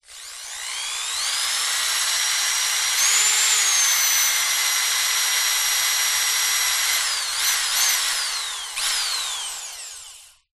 Hand Drill

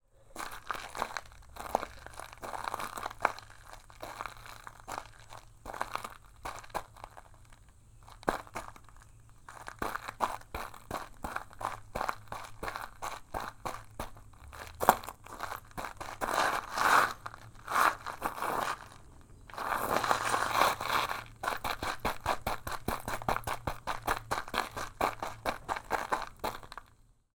foley footsteps broken glass debris; martinimeniscus

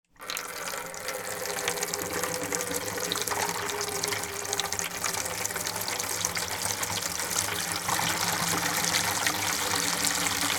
household sink tap on water run off; sound effects one

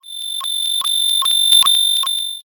science fiction radio transmission received

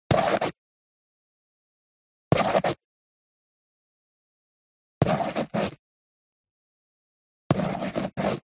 Handwriting BallPen Book Short; D&H; soundbox library

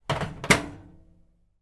household microwave oven door close

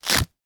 household disposable nappy side tab open

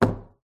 phone drops on wooden table; your free sounds

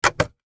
van ashtray close iveco; transportation; sound effects; sound effects one

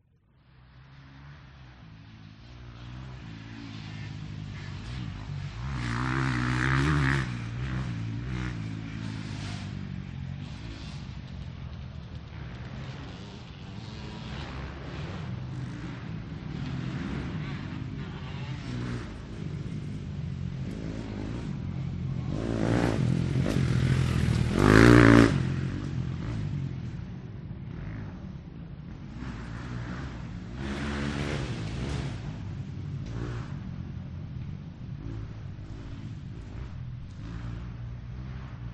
vehicles motorcycles motocross race pass

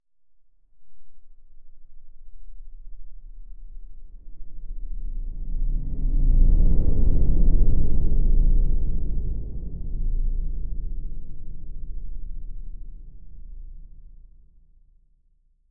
Whoosh Rumble; bottlerocket

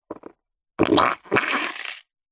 cartoon squelch wet poop fart forced runny; zapsplat

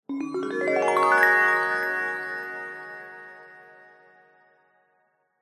magic glissando ascending bright warm twinkle; fantasy; zapsplat

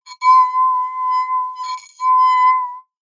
household wine glass finger rub rim tone ring; zapsplat

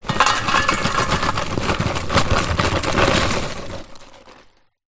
food popcorn pour from paper bag into metal bowl

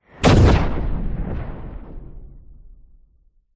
science fiction explosion; zapsplat

